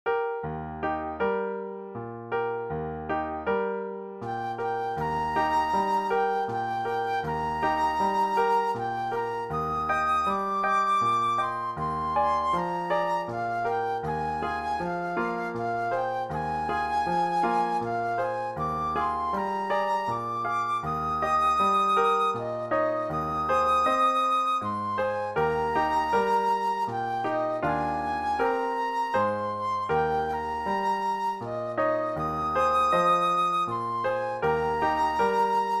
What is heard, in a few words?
lullaby flute; brahms